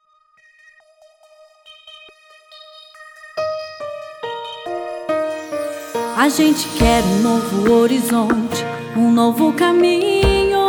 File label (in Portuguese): Jingle SEM LOCUCAO Renovacao; Trindade